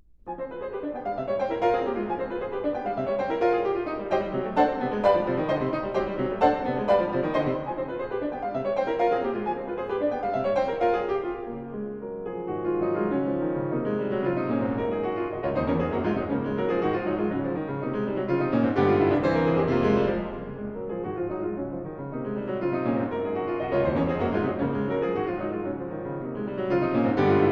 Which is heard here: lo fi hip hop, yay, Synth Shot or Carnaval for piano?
Carnaval for piano